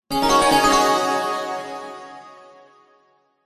multimedia game sound happy bright warm complete tone